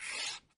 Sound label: slide; jocly